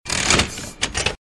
cash register, A small cash register, looped in a groovy way